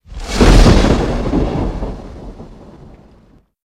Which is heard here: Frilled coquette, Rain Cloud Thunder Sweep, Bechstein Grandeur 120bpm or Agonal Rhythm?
Rain Cloud Thunder Sweep